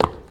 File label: pl step; svencoop